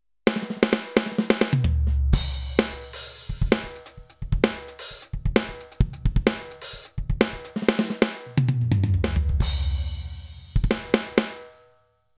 Octapad

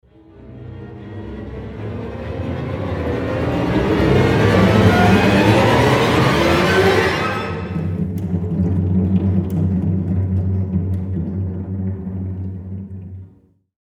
Strings Effects

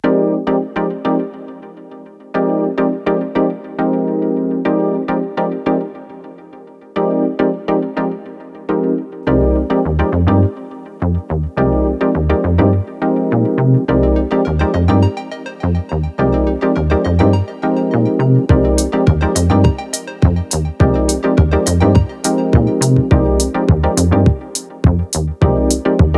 Jupiter 6 Track

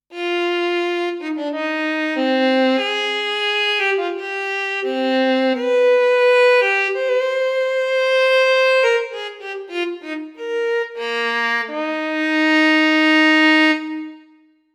Special Tone Violin Roland Lucina AX-09

